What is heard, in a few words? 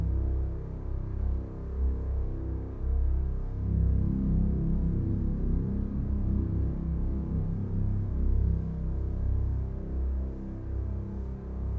Stomp Loop; Planet of Lana; Mus Ch12 Freighter